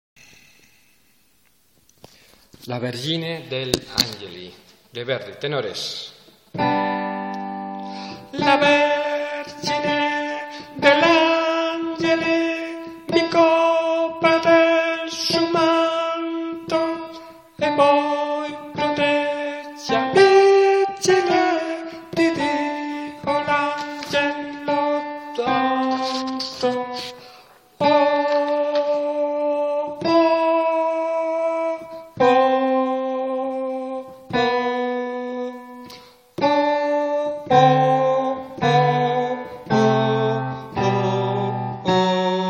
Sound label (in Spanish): tenores; Valladolid; La forza del Destino (La Vergine degli Angeli); Orfeón de Valladolid